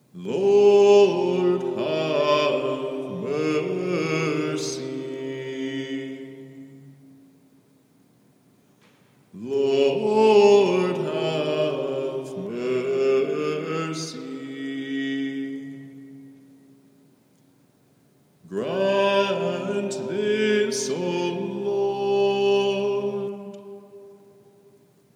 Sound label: Completion Litany